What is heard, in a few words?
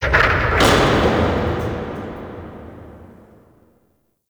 Gas City distant metal latch huge; Warframe; Corpus Gas City; Ambience